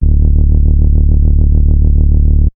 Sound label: Synth oneshot Low LFO D, Synth Bass; Synth Bass One Shots; Slate Digital BASSHOLE Sample Pack